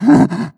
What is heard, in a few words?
breath short, zombie